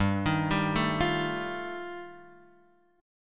G 7th Suspended 2nd Sharp 5th G chord, Guitar Open F; arpeggiated; JGuitar